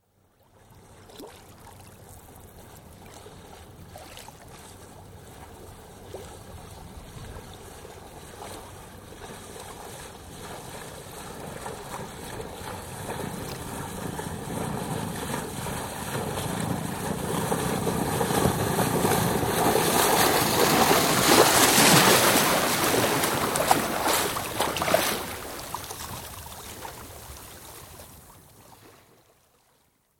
Sailing Dinghy, sail past left to right (Recorded on shore); Boats; BBC